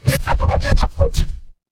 transition record scratch

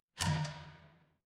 door creak

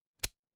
ui toggle

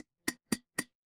glass clink

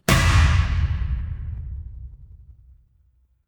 transition impact hit

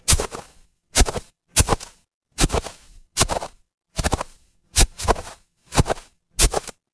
footsteps snow

